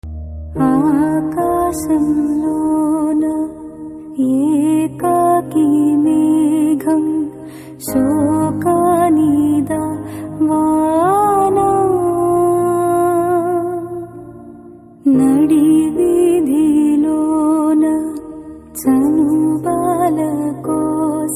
Slow Bit Ringtone

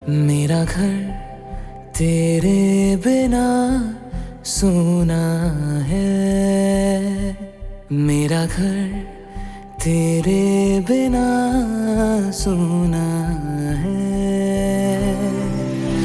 Ringtone; Bell Bottom

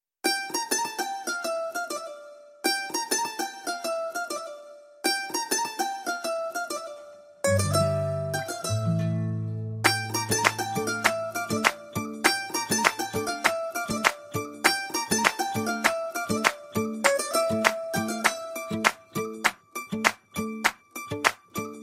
Instrumental

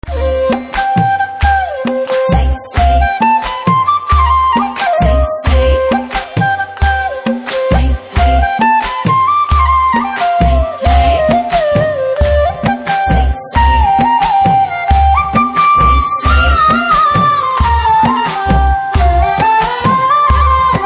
BGM Flute Ringtone

